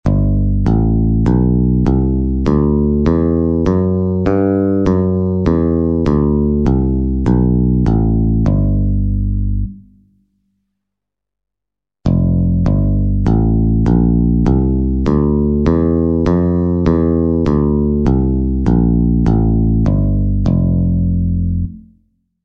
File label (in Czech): Bass Stupnice C dur a F dur v I poloze